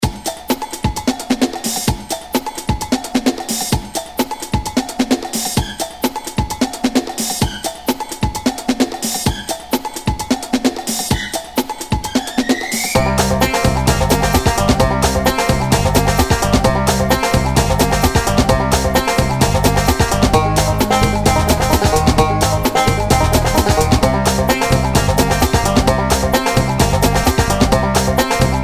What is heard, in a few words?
Banjo Hop, Blues